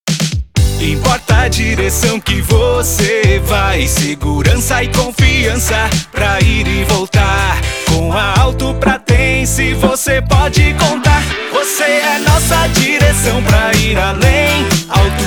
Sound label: jingle; pratense; produtoraup